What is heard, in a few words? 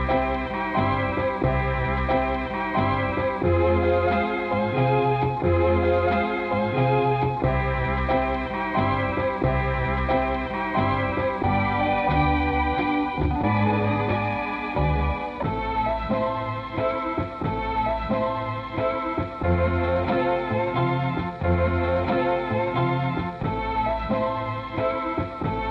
country waltz ringtone